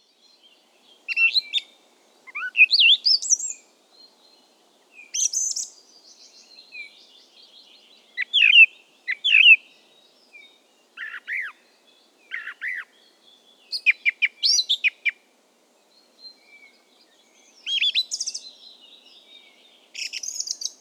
Strazdas Giesmininkas Song Thrush - Turdus philomelos